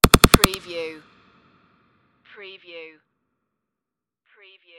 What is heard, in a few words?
space machine gun